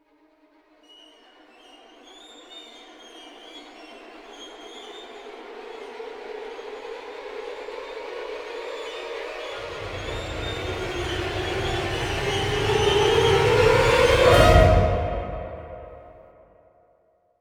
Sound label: First Hit Only